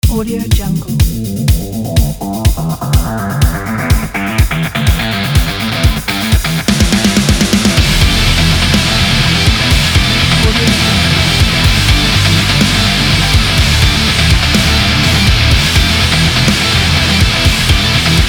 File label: hard rock